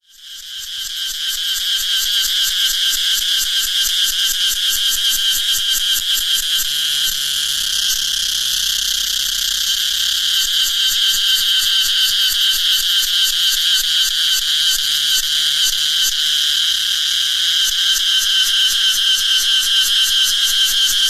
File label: angkor cicadas